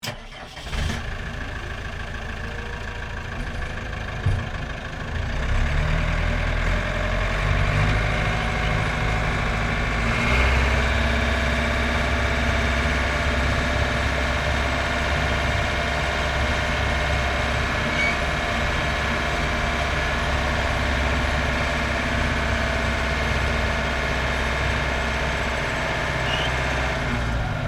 Tow Tractor Activity, Industry, Tow, Tractor, Activity, Start, Drive, Off, Interior, Perspective, Diesel, Engine; Pettibone; Tractors